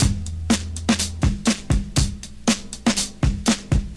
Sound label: 121 bpm modern drum loop A key, kick tuned to the A note